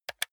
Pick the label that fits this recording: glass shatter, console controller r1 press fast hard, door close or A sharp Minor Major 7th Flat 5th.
console controller r1 press fast hard